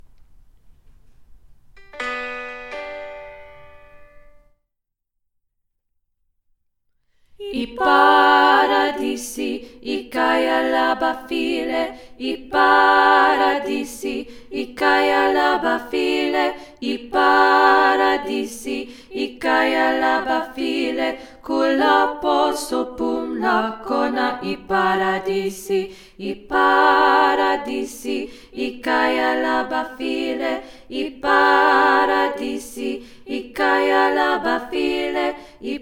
Ipharadisi SATB

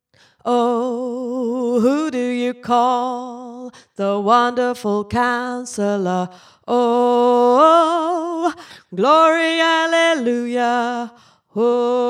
tenor; wonderful counsellor

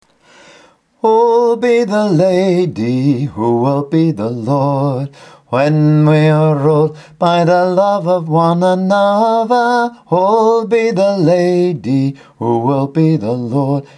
soprano

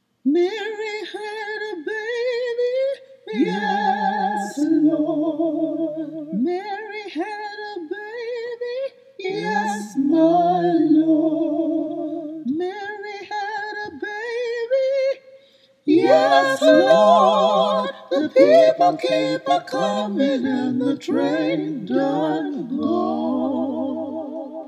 mary had a baby all parts

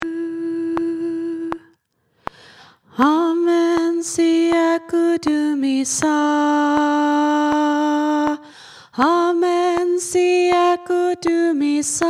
Soprano; Amen Siakudumisa

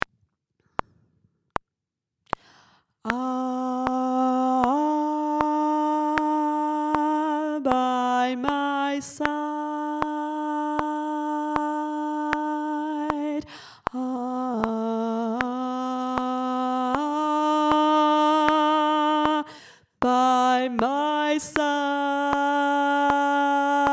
tenor; soul wind

